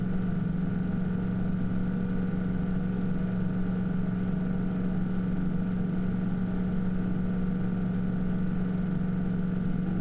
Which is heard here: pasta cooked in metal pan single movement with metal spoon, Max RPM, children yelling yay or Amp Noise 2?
Max RPM